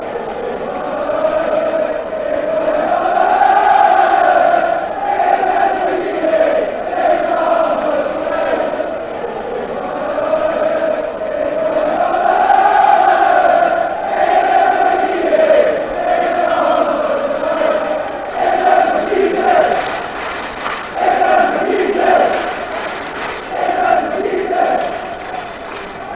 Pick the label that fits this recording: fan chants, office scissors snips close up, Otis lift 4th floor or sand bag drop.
fan chants